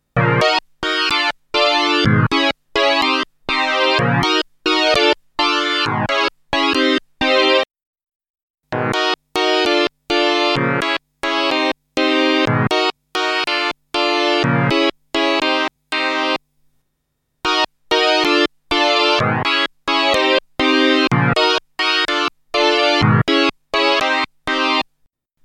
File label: Polysix Chord